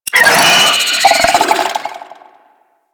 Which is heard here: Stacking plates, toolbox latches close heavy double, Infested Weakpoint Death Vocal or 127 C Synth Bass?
Infested Weakpoint Death Vocal